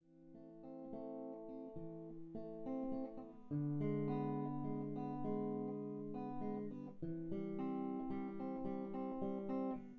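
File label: no drums synthetic convolutive 1m; SASSEC07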